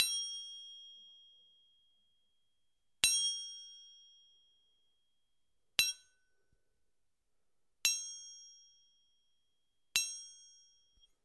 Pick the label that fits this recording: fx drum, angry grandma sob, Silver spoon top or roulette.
Silver spoon top